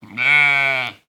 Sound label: Sheep Baaing Norwegian Sheep Expressing Itself Concisely